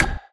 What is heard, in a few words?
Perc Wooden Shadowed, One Shots, Drums, Misc Percussion